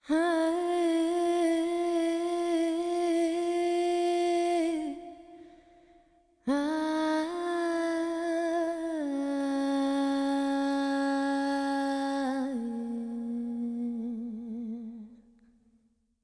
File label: Chant; The Cameron Files Pharaoh's Curse